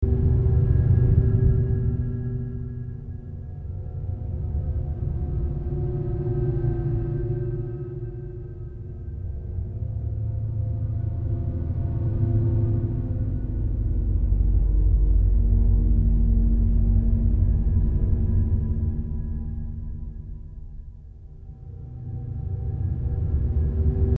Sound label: Library Exploration; Metro City